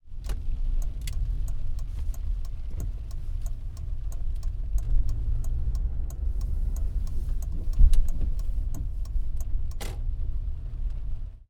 Turn Signal In Heavy Rain Interior

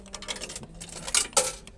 Vending Machine Coin Insert

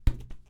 Ball Kick

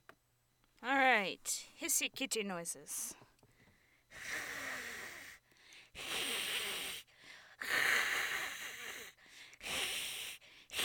Cat Hiss Yowl

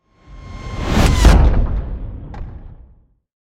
Transition Hit

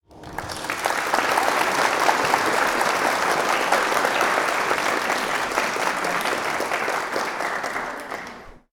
Strong Clapping 100 People

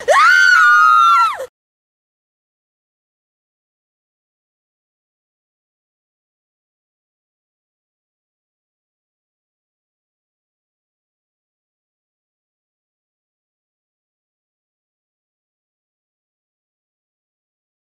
Girls Screaming

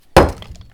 Rock Impact Mono

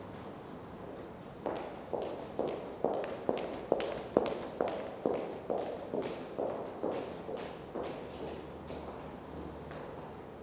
High heels

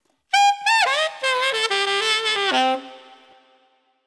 8 Beat Dm 90

